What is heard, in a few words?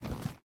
Start Jump